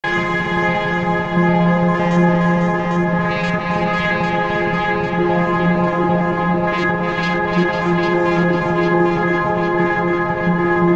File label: octave wave swell